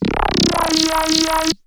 Monotron Sci-Fi

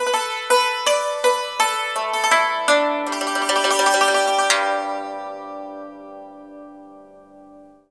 YANG CHING, Heart of Asia; Spectrasonics

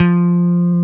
BASSES, Roland

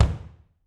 BD, Drums; Bob Clearmountain Drums I

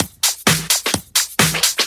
Straight Loop 128 BPM, House

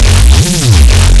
Neuro Bass

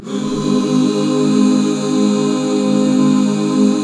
DB MAJ; Best Service Gigapack 1

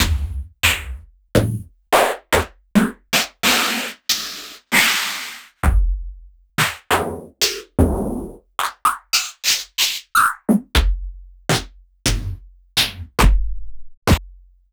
Elektron Monomachine

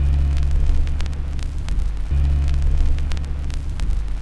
Crackle Loop, Vinyl Noise; noise hiss crackle

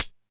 Alesis DM5 Hat

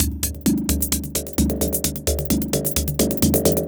MODULAR LOOP, STRANGE MACHINE LOOPS

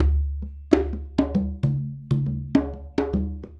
Djembe and Conga

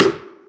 Percussions; Vengeance - Essential Clubsounds Vol.4

